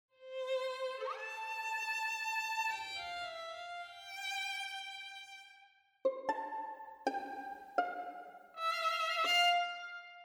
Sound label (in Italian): Violino